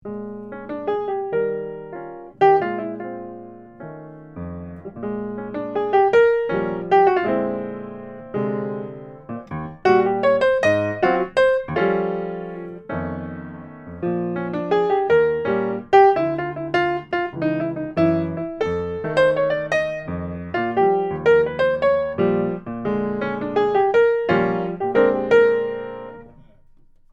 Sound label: playing all, Blues